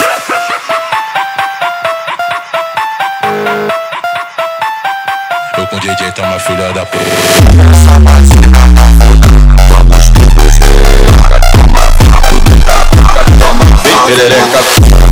RISADA Hardstyle Remix bassboost

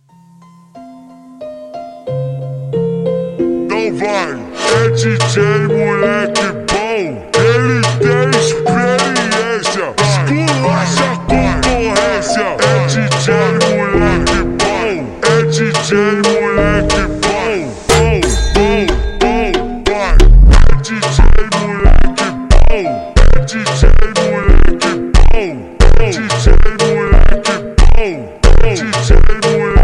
Funk ultra Slowed

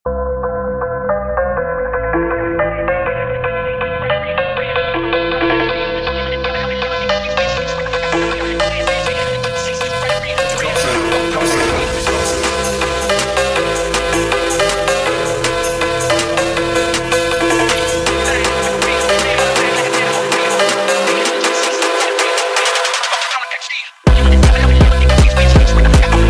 Phonk House Version speedup

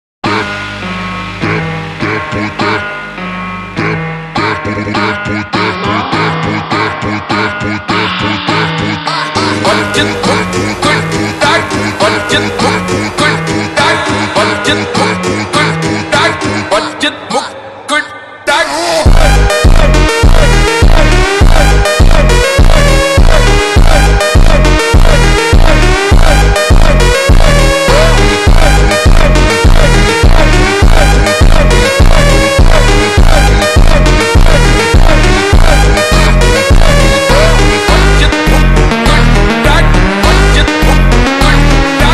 super Slowed

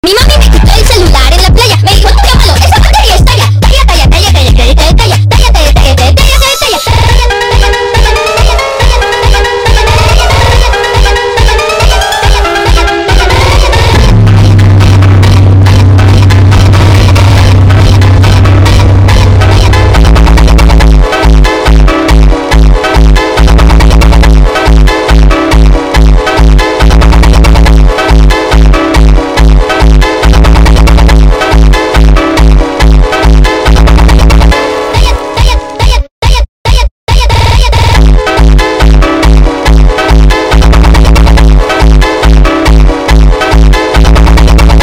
funk bassboost

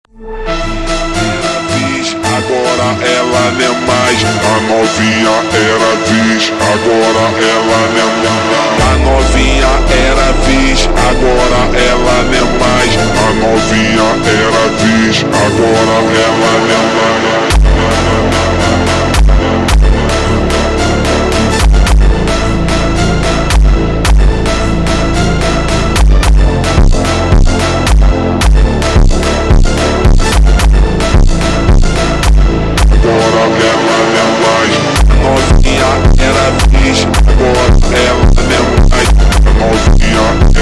Funk super Slowed

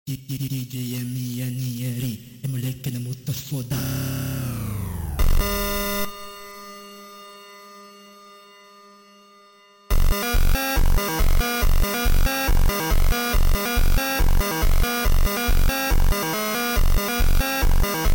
Funk instrumental

